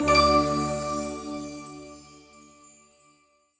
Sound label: win Small; imperialriches